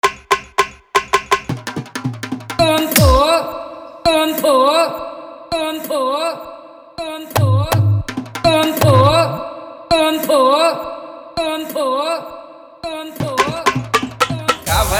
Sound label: Funny Song